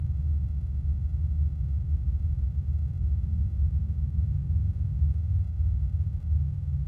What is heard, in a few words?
underground cellar, ambience; Soldier Of Fortune II Double Helix; XBOX; finca